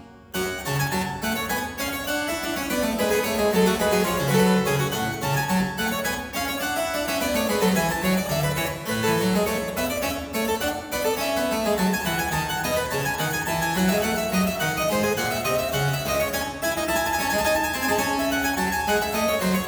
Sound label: Suite No.3 in G minor, Gavotte, Keyboard Works; J.S. Bach; English Suites; BWV 808